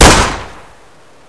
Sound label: usp unsil, weapons